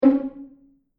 Incorrect Wrong Noise

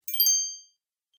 Sparkle Interface Sound, beep sound effects

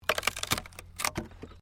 WOOD PLANK TWIST

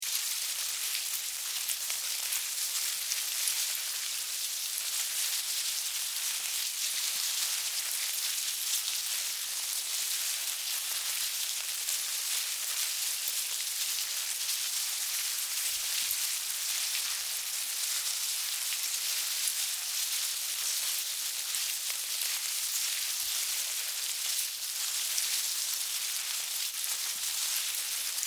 Insect Hive Bug Movement Loop; Animals:Insects

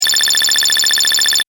Retro Game Repeating Sound; Video Game Sound Effects

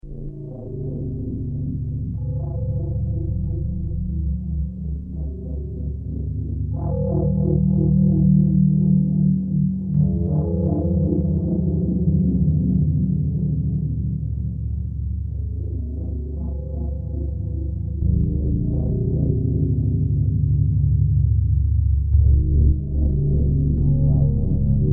Deep pulsing low frequency waves; Deep Tones; Sound Design

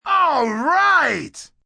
Cheer Alright, Cheering, male; Crowd Sound Effects

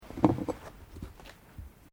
KNIFE KITCHEN PICKUP; Various knives; Kitchen (Sound Effects:Household)

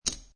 Poker Chips Drop One Chip Onto Another Chip; Gambling

